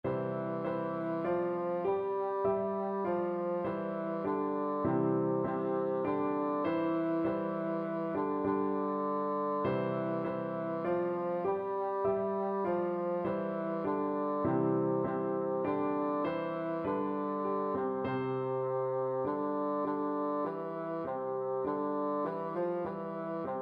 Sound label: ode to joy, bassoon